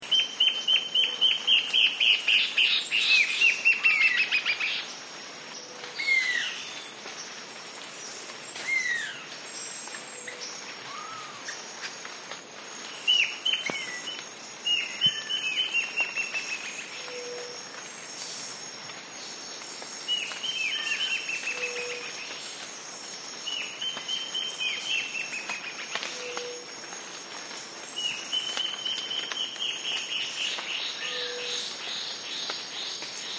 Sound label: Antbird duet